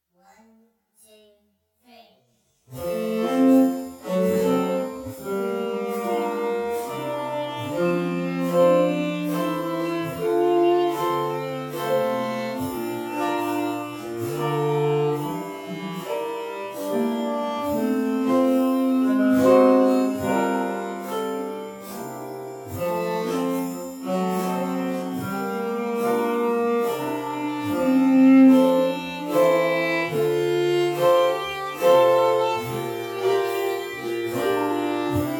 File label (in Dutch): Paris Cafe transp langzaam